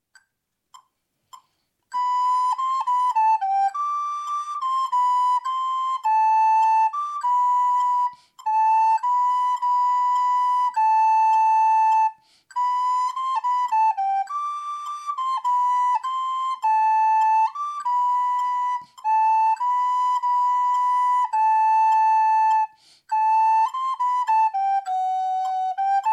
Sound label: MP3 FLUTE; Les dieux